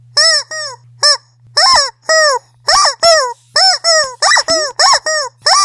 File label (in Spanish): pollito de goma sonido